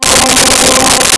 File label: Choir Ahhs C4; Nintendo; July 2020 Gigaleak